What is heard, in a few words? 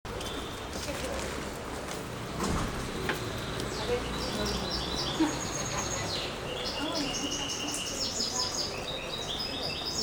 besiktas street and songbird